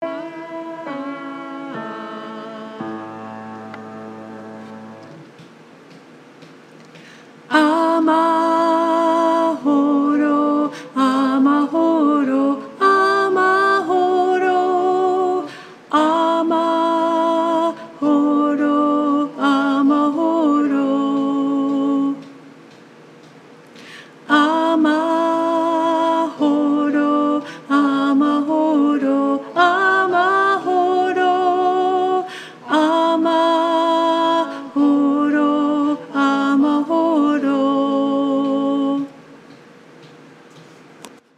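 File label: Soprano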